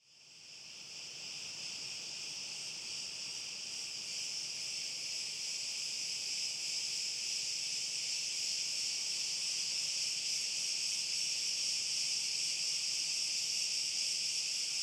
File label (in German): Frei Synonym Oberton Spiel Grillen